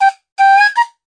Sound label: edgy flute melody; MELODIC SAMPLES